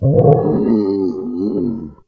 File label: scrm; The Elder Scrolls III - Morrowind